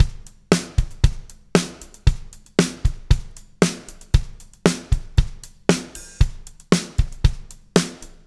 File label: MED POP, Backbeat, L